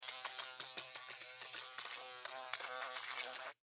Fly, Window, Hit, Surface, Buzz, Wings, Insect, Bug